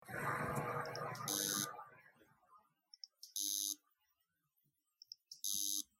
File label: 8TB hdd dropped problem sounds